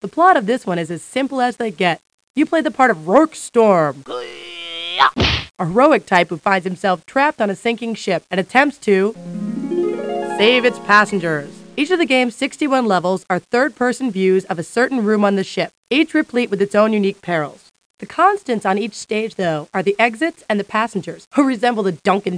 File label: sink; articles